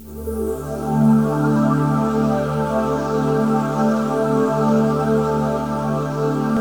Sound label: LIGHTWAVES; DirectWave; DreamPads